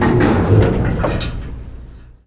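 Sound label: platform stop; ambience; svencoop; urbicide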